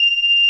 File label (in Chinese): 吹哨; Fate; Apocyrpha; 阿福